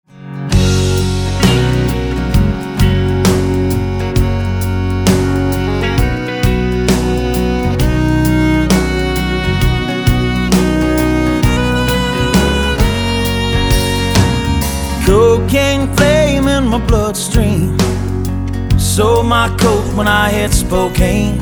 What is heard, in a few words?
4-4 half-time shuffle; rhythm; meter id